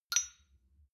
shot glass clink soft; berufe; soundpool

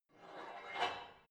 barbell heavy plate pull out quick, fitnessstudio

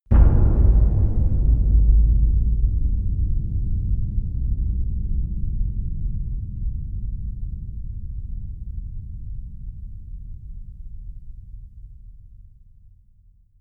dark cavern impact, impacts; hits explosionen

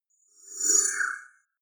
swell short beam; sweeps-swells

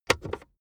car accessories center console compartment open; autos; verkehr transport

